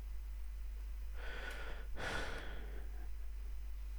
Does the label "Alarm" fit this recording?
No